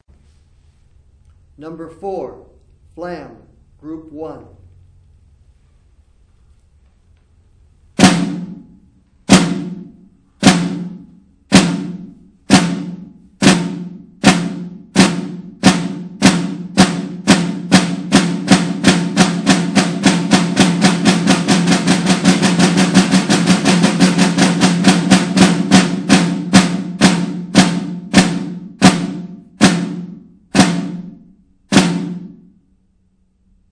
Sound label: snare rudiment